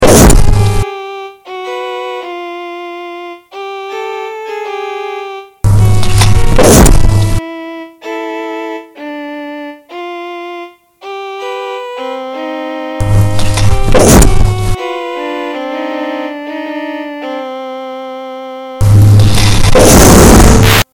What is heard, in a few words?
Signals